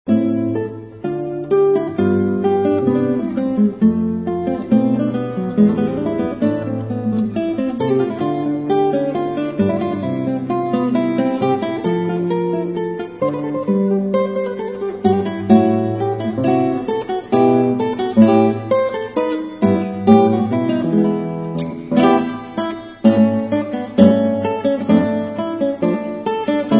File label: gavotte